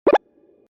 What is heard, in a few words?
Squish